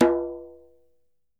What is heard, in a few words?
Djembe - C#; Cymatics; Zeus Percussion Collection